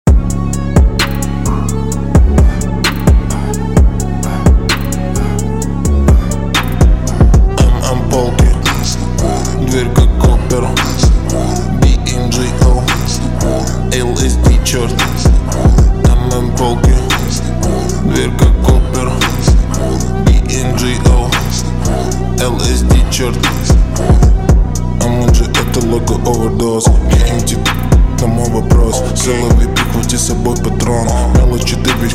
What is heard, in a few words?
slowed reverb